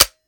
weap fire first; weapons; gmod; viper; scharlie

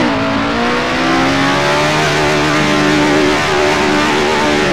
second, ferrari 312; vehicles

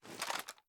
inspect; gmod

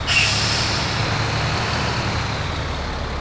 rev short loop, vehicles; phantom

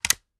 selector on; viper

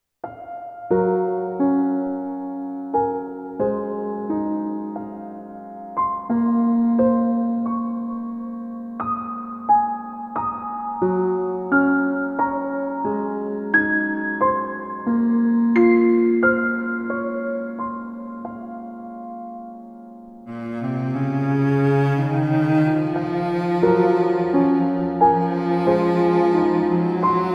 Where Are You (Duet Version); Finding Paradise Soundtrack

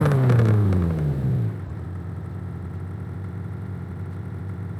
throttle off slow, vehicles; mx5roadster

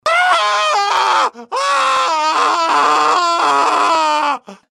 scream, male; gmod; npc

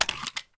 ar xmag reload empty mag out, weapons; gmod; viper

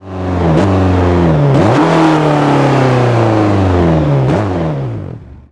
throttle off, vehicles; gmod; tdmcars; jee wrangler fnf

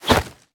melee attack knife; viper

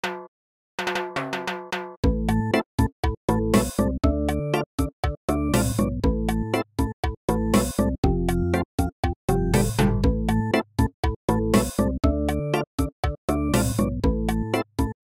reggae; old runescape soundtrack; osrs

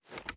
reload empty drum tilt; gmod

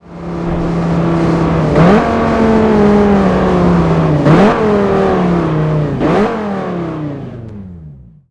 throttle off, vehicles; 850r

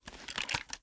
reload end; gmod

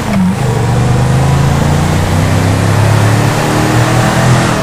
second, vehicles; gtav

